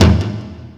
BOUNCE

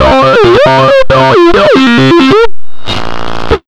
LOW 135 SYNT, Big Beat

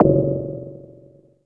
RM BELL DRUM; Zero-G Ambient 1; ANABELL PERC

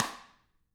TAMA, Drums

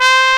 BRASS C3, POP BRASS; AKAI S6000 CD-ROM - Volume 1; BrassWind

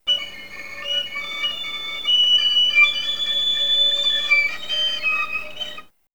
FL FX, Advanced Orchestra

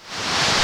NOISE

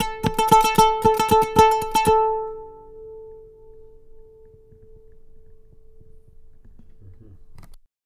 Oud, Arabic; Sonic Foundry (Sony Creative Software); Continental Drift Aftershocks

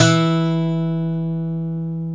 SAZ E 1; Soundscan vol.53; Mediteranean Traditions; AKAI

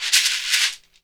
SHEKERE, Synclavier Percussion; ILIO; Synclavier Percussion 2; World & Orchestral

